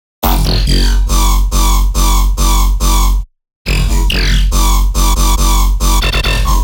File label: Riddim Bass Loop F# 140; Squirming; Ghosthack; Riddim Essentials Volume 2